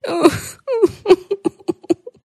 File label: human FEMALE sustained cry sob